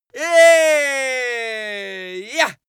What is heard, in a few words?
male victory citizen